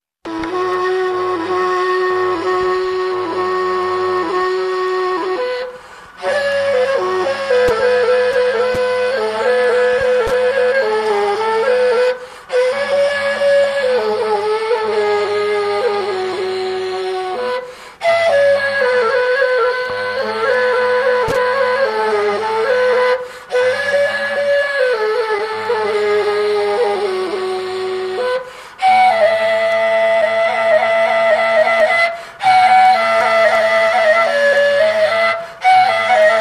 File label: Turkmen flute piece